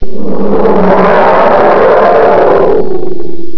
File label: intro scream; valve; bullchicken